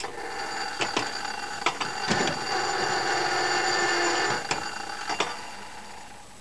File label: Point Motor